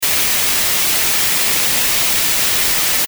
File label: white noise